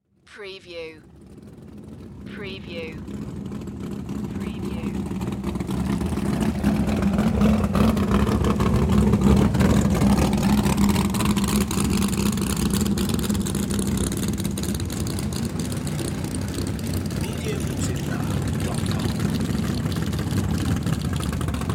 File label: Swamp Buggies Pass By; Boats; sound effects bible